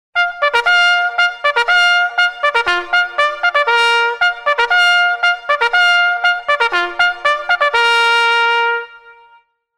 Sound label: adjudants call, bugle